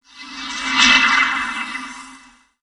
Pass By

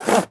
Rubbing Scratching Porte Studio

